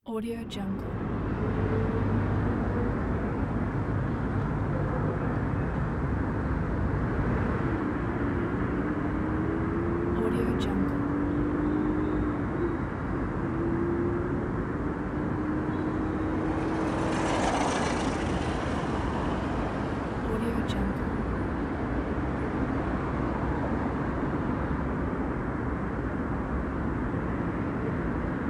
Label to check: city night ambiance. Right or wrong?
Right